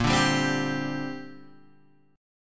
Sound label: Bb 6th Add 9th Bb Guitar Open G